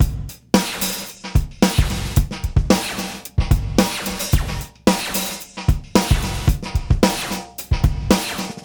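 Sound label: fx, Beat Loops, 111 bpm; Zero-G - Monster Beats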